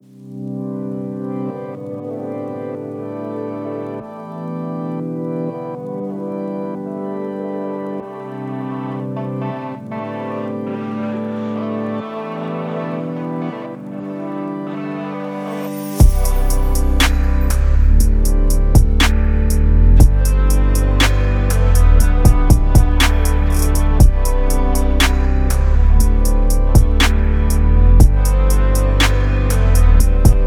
medlennzya spokoinaya muzyka

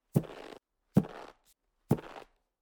ded moroz topaet

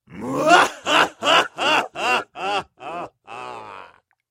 kovarnyi smex zlodeya; Высмеивание и издёвка над людьми

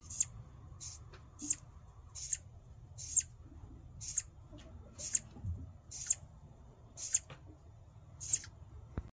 belka letiaga

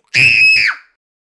visg detskiy

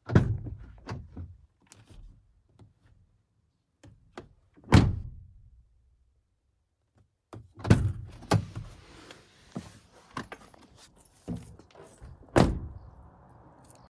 dveri gruzovika; zvuk gruzovika

